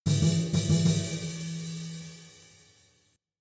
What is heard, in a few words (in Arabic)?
إنتقالة إفتتاحية